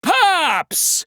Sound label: pops; monosuke